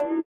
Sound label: SITAR; Spectrasonics; Metamorphosis; INDIATEK